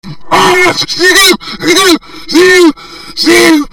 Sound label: scream, zombie screams